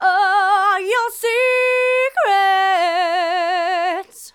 Female vocals and robo-vox

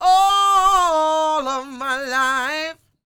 SING, Vocal Planet Blues Soul BLUESY

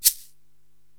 Shaker, Drum Machines, Korg TR-Rack

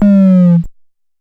Drop Lo, Stylophone Beatbox

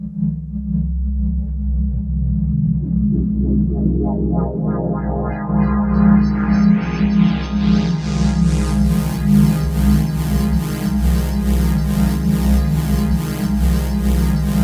sweep, ambient atmospheres

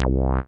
ANALOG, BASS; Best Service; Hyper Dance

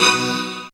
synth b, Dance Synths, Stabs & Hooks; Best Service ProSamples vol.37

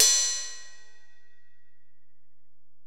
Ride, Alesis DM-PRO

